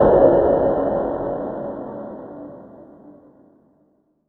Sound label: Impacts